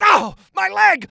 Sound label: Male Pain Scream